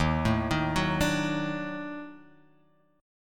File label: Eb Minor Major 7th Flat 5th Eb down; EbmM7b5; arpeggiated; JGuitar